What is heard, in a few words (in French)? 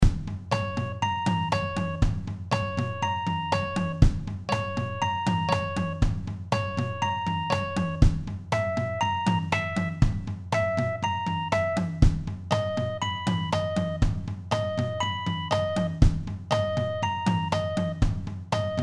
carillon